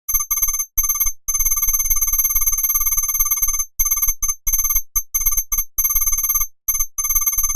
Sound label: typing